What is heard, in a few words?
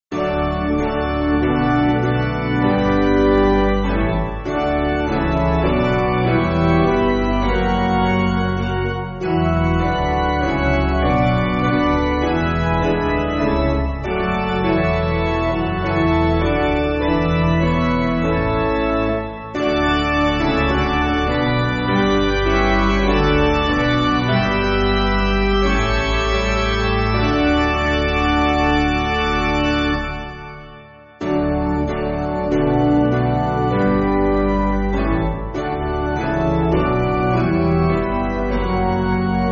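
Piano, church backing; Basic Piano & Organ (CM)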